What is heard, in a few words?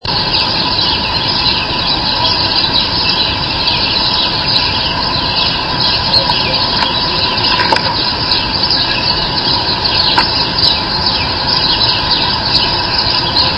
Birdsong